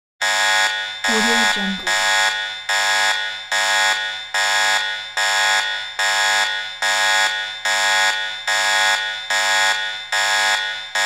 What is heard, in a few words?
contemporary factory alarm